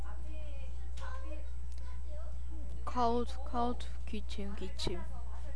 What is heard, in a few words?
cough